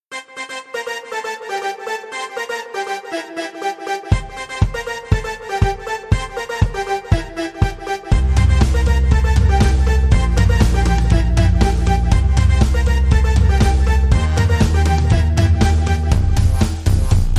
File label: No Melody